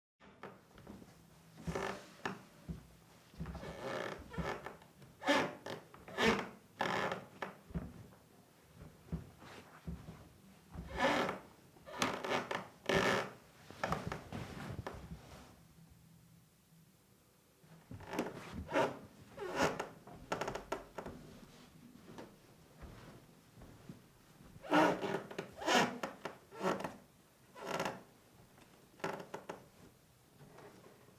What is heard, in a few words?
Creaking